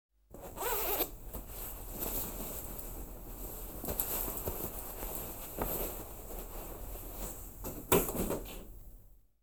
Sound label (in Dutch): Uittrekken Jas; KMP Film Video Sound Effects